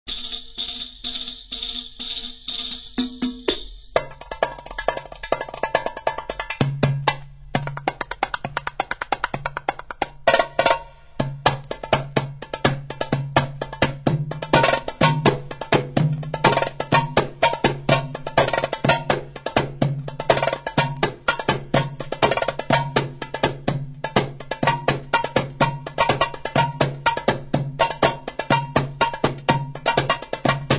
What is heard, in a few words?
Egyptian Drums, Baladi; Belly Dance Performance; Heavy baladi percussion